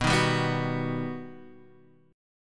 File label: G Augmented Major 7th